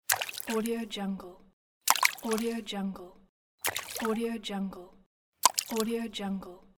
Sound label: throwing stone into water; julesounds